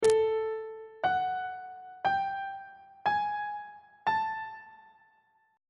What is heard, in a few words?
g double sharp 7th interval pitches on treble clef